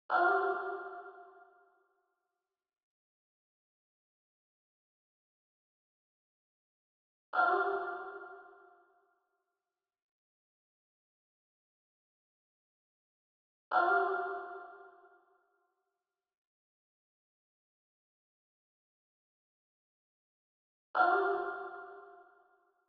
141 BPM C Min Vocal, Drill; Cymatics; 2022 Melody Collection - Drill; Red Eyes

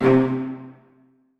INSTR (C), Melodic One Shots; Cymatics; ANALOG EVOLUTION Beta

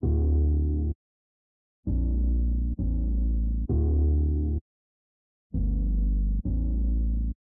131 BPM D Min Bass; Loop Stems; Cymatics; 2022 Melody Collection Platinum Expansion - Pt 2; Triumph

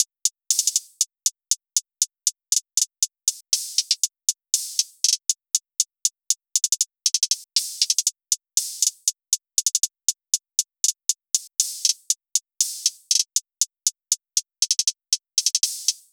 Hihat Loop - 119 BPM; Drum Loops

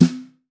Birch Brush, snare; Drums; Ghosthack - Free Snares 2023

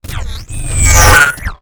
wooshes transform sweetener

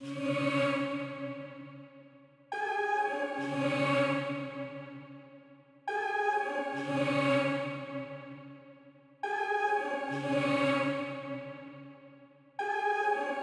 143 BPM C# Min Angelic Vocals; Rome

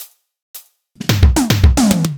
Drum Fill - 110 BPM; Drums; Fills Rolls; Cymatics; Slots

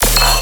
Bass Hit D#, Dubstep; Ghosthack; Dubstep Sounds 2025; Luster Bass

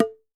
Double Bongo - A; percussion; Cymatics - Infinity Beta Pack 2.0; Zeus Percussion Collection

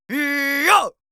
Yells, Viking Vocal

